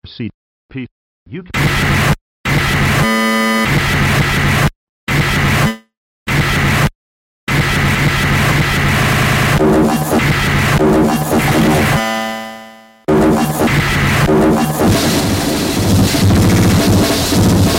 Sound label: drums remix